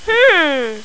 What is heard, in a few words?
"Hmm"; Revelation Sight and Sound SK